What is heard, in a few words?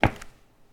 Footsteps Concrete Asphalt Street